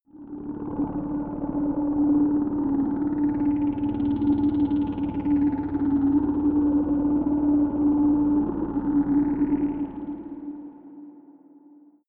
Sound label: space quiet hover fly; raumschiff